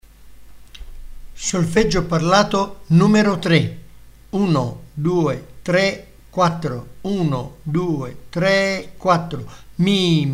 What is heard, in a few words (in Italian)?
Solfeggio Il mio amico flauto